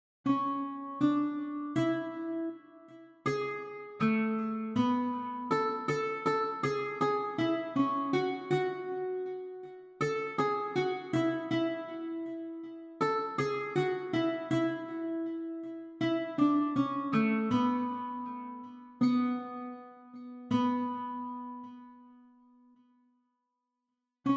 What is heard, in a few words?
Karaoke Ko Sihova hoku haoanga